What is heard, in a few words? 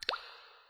drip